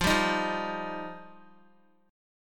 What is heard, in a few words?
F 7th Suspended 2nd F down Guitar; F7sus2; strummed